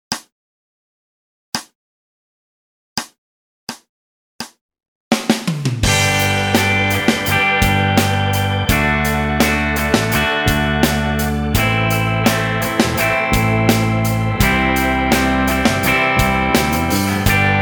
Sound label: Rock Backing; RGT; Rock Preliminary